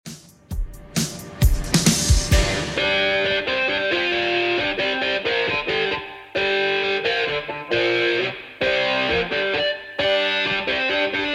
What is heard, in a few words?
80s Rock Redrum 133bpm